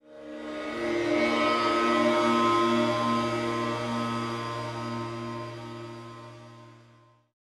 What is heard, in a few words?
Stinger Transition; Skykeepers; PS4